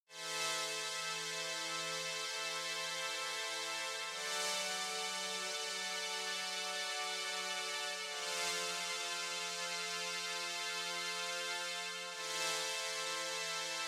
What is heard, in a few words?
junior dark strings